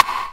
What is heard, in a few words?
Rim; RnB; Takebeat